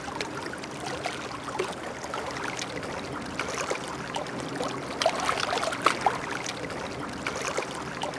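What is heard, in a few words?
water laps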